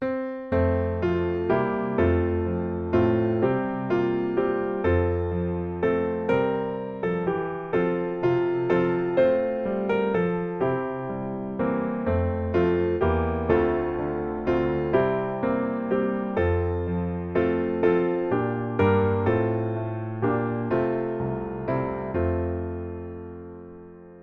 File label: piano